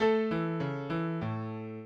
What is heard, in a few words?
minuet, mozart